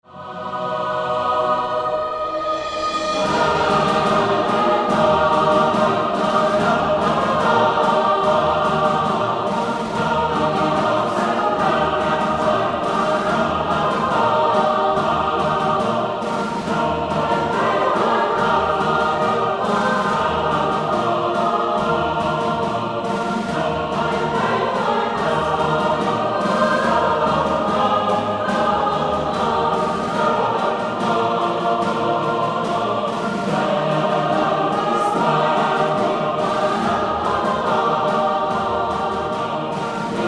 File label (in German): drummerboy